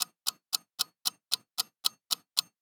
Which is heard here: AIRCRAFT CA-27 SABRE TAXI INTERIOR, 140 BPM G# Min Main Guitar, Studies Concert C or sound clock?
sound clock